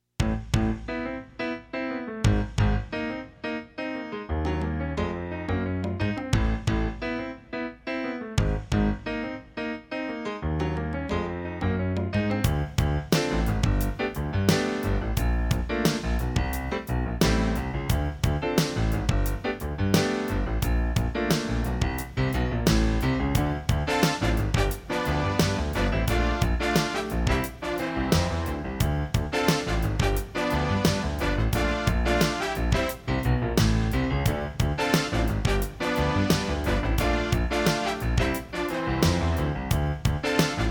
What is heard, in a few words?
Electrophonic